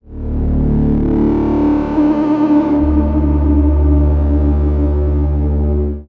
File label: Tonal Modular Drone; increased control studio